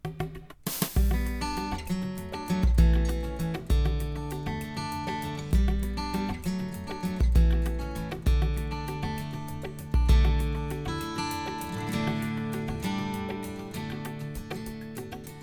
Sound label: instrumental